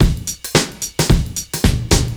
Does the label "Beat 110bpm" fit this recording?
Yes